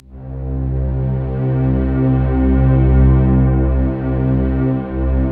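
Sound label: orchestra choir, Orchestra Choir Stack; Optical Media International; Sonic Images Library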